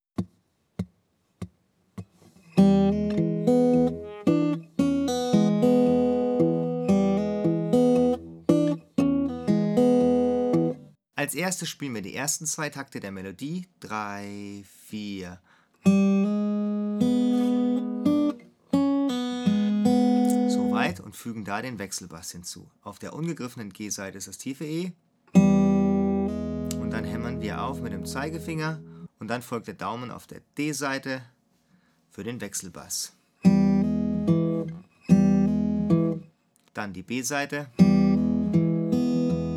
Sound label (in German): Delta Blues Takt, Bluesgitarre